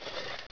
bandit foot drag; gearbox

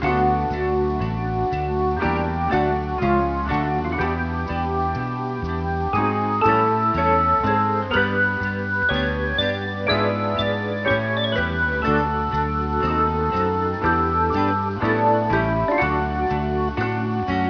jazz

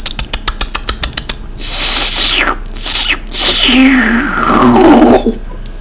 winners slurp

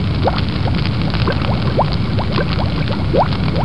lava bubbling, ambience; dod

